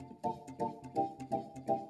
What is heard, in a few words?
E PIANO 125, Keys; Houseworx